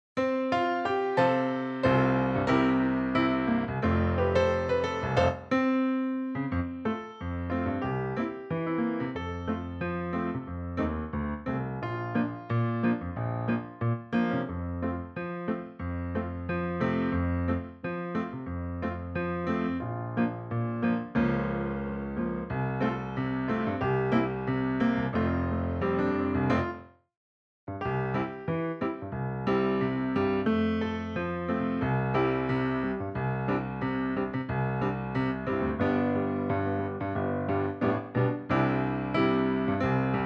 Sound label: cowboy carol piano